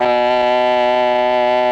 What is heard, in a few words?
OBOE; ILIO; Propeller Island Legendary M400